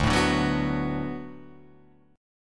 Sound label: D 6th D down, chord